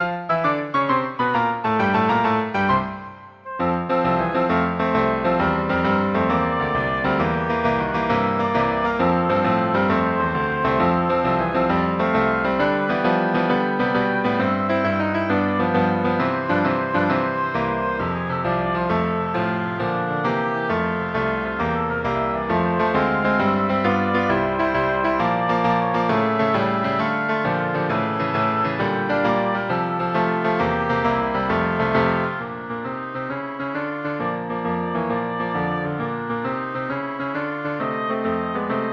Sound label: sousa liberty bell, oboe